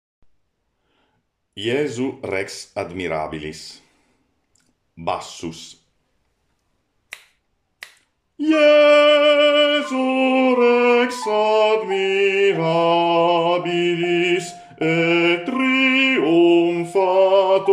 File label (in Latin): Bassus; JESU REX ADMIRABILIS